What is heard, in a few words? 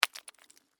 Ice: small chunk of ice lands on hard surface; Snow Sound Effects; Stuart Duffield